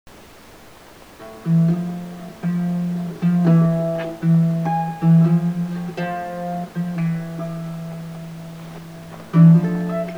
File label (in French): organe